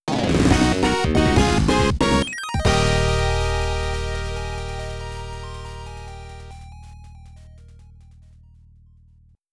Stage Clear

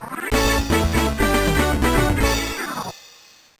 Unknown Jingle; Sonic Advance 2

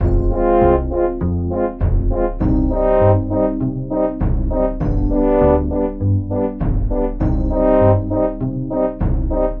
Elevator; Toontown: Corporate Clash Soundtrack; Boardbot Building

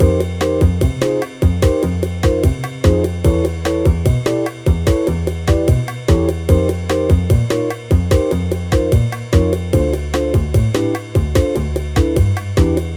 Elevator; Toontown: Corporate Clash Soundtrack; Cogs; Major Player

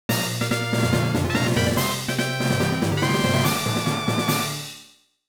Fanfare - Badge Obtained; Pokémon FireRed and LeafGreen Remastered

